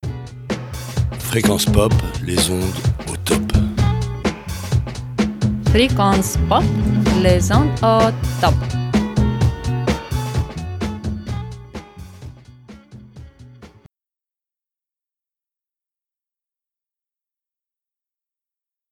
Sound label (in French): jingle frequence pop